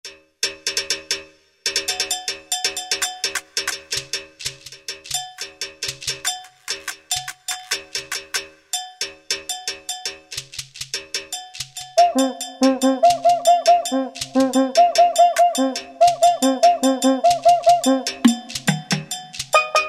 LATIN, Roland SN-U110-02 Latin And F.X. Percussions